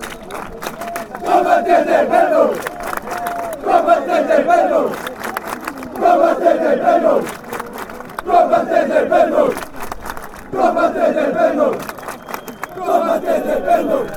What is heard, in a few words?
fan chants; lecce